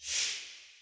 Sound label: fling, swish